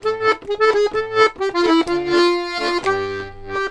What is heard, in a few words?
Accordions; USB Soundscan vol.40; AKAI